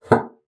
BASSOON; Xsample VOL-6

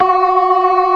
ORGAN, NO PERC; Keith Emerson Signature Series; C3

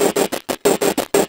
Glitch Stutter, Synchro Funk, Beats; Sonic Foundry (Sony Creative Software)

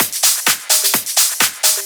Discohouse Loop 128 BPM

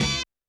percussion, Roland TD-20

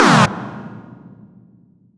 Stab C2, Club Techno; Zero-G; Stabs

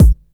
HOUSE DRUM BD; AKAI S6000 CD-ROM - Volume 1; DRUM RYTHM

